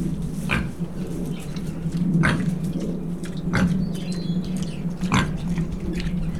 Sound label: Pigging; Animals